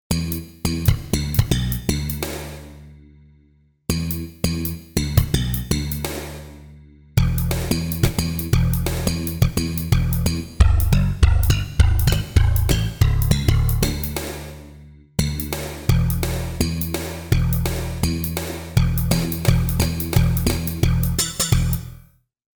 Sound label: Roland JV-880 House Hunter; Factory Patches